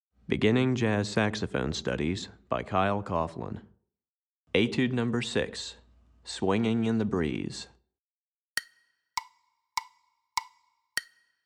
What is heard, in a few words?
Piano Part, Beginning Jazz Saxophone Studies Alto